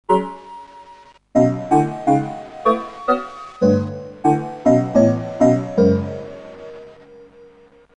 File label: chord wave